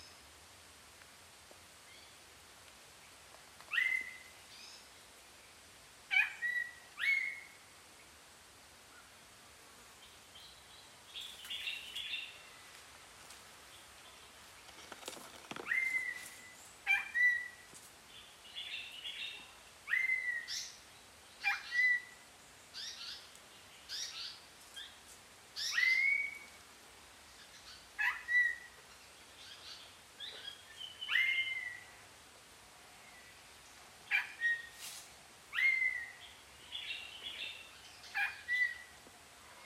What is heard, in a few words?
Neumann's starling